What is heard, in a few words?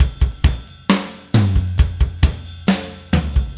Acoustic Drumloop